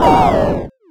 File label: Explosion